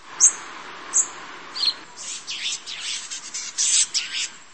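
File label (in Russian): pronzitelnyi pisklyavyi svist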